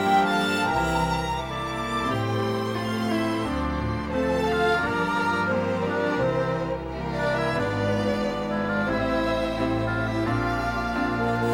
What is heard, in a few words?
Accompaniment; The School Musical 2024/25 - Guys & Dolls Jr.